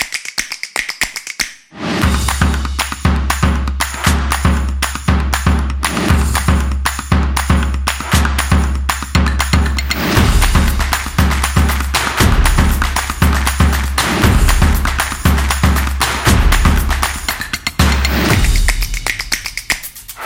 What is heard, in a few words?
Energetic Percussion